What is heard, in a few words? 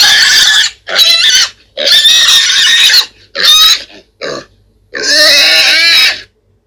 pig squeal multiple